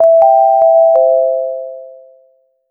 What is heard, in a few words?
short sound effect, positive